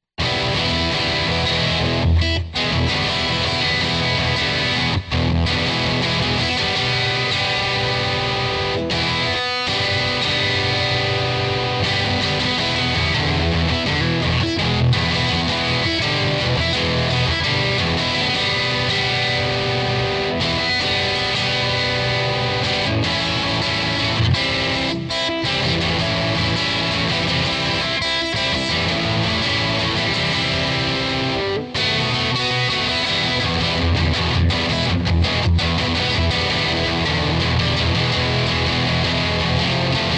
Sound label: electric